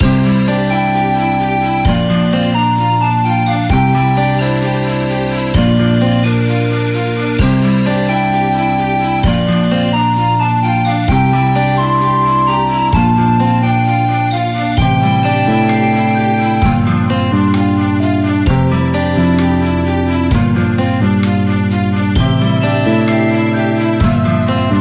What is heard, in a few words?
130bpm loop